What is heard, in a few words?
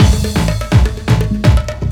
thunderbird, Deep House 125bpm